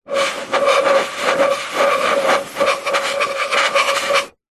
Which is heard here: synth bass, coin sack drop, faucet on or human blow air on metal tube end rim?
human blow air on metal tube end rim